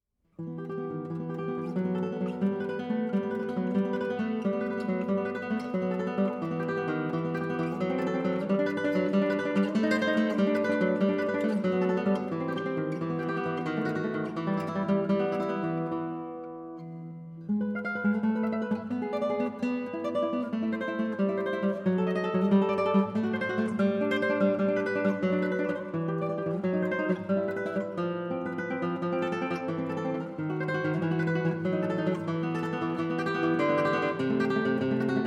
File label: Guitar Solo